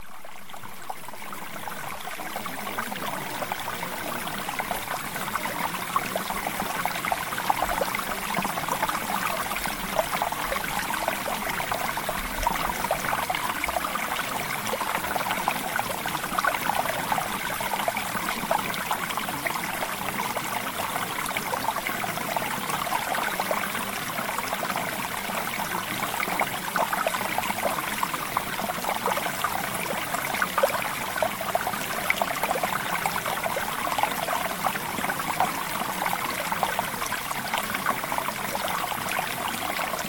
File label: Mountain Stream; Premium Subliminals